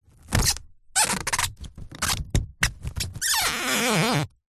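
household cling film wrap stretched handle rub squeak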